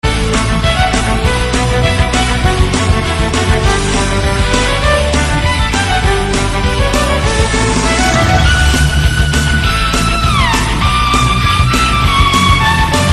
Violin

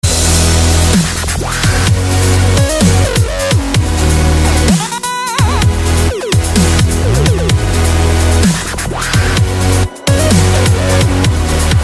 Techno Mix

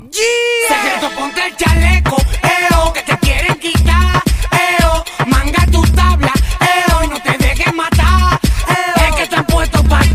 Rap

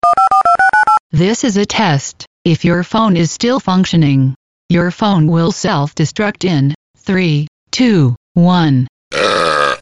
Message Burp

